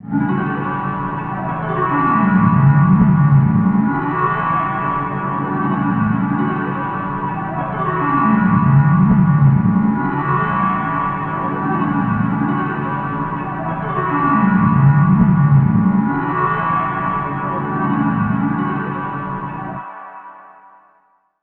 Harp D2; Zero-G - Ghost In The Machine

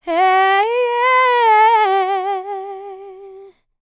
LICKS, Vocal, R&B; ILIO; Dance; LT R+B

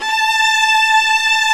STR, Vlas Sect, Agitato; Roland L-CD702; Vlas Sect1-2

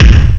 bass drum; ST-XX Amiga Soundtracker Sample Packs

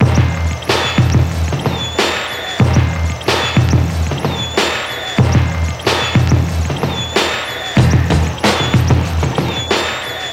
breakcore breaks; directed energy kill device

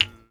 KHOMUS M, KHOMUS NT; USB Soundscan vol.60; Asian Traditions; AKAI

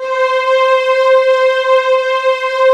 LUSH STRGS, JV PADS; Pro-Rec - Synthsonic

